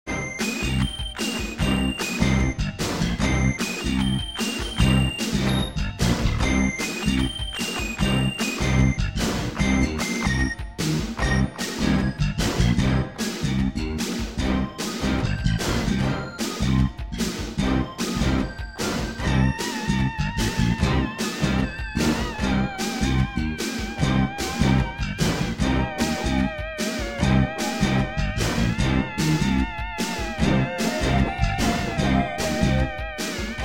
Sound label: MIDI